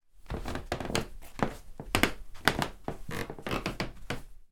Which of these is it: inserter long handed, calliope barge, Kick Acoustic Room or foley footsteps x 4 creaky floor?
foley footsteps x 4 creaky floor